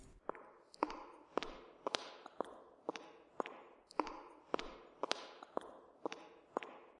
walking in heels medium room; footsteps

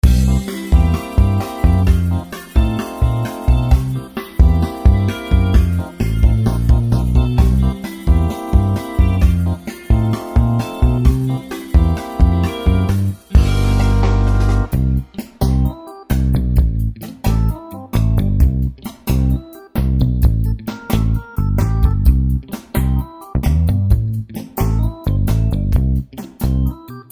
Beach Days, Music background pads

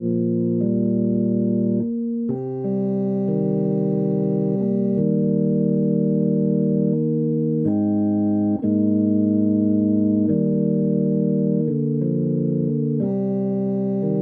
Hammond Mic Lo

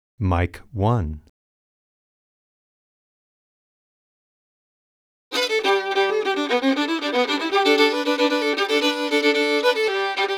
Fiddle

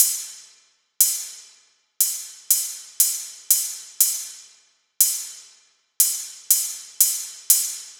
percussion hihat closed

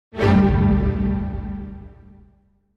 Sting, orchestral Stings

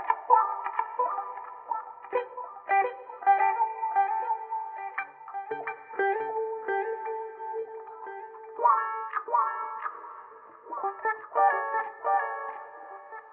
Guitar

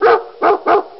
dog bark; animals

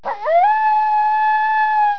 coyote; animals

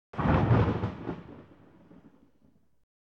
Thunder Clap; Single Clap, ambience

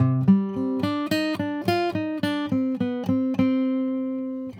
Acoustic Noodling

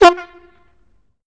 toot in F; whistles Horns Bells

